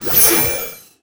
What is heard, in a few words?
skill explo